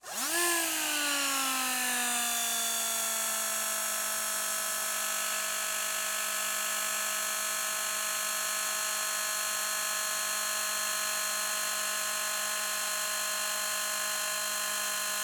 Hair Dryer On Off, Broken Hair Dryer; InMotionAudio

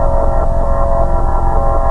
air turret fly loop, vehicles; hl2

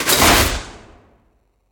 Impact - Large Space

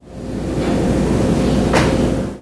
shower hit; hl2

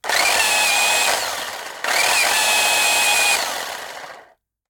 Old Electric Wood Plane; SoundBits; Electro-Mechanics ToolKit

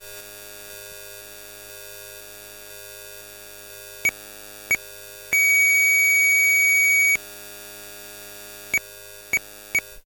Electromagnetic; InMotionAudio; Electromagnesis Samples Volume 1

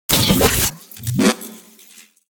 Weapon Foley SciFi Texture, Future Melee Weapons; Audio Summoners

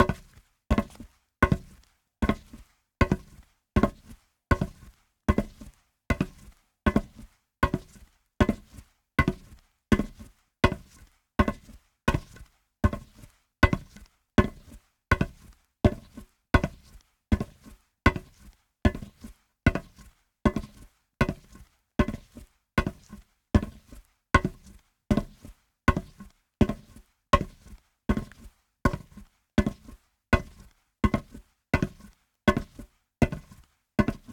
Foley Footsteps Metal Boot Walk Normal Close; Tovusound; Edward – Foleyart Collection Add-On Extended Footsteps; GDC 2017; Game Audio Bundle

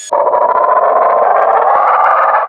roller mine taunt; hl2

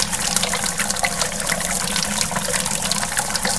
leak, weather; hl2

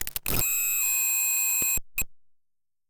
Electromagnetic Field Monitor Speaker Abuse; Glitchedtones

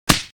Hit; NoizBoy; Hong Kong Action Kit - Hit Kit #1

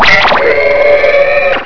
houndeye attack; hl2

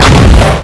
explosions; hl2; impact

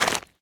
footstep ice crunchy run

